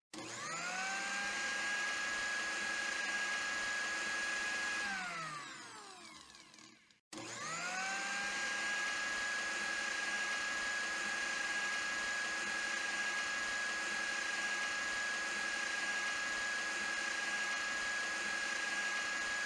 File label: blender sfx